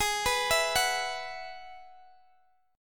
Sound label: Gsharp Minor 7th Sharp 5th, Guitar, DADGAD; arpeggiated; x,x,18,16,19,16; G#m7#5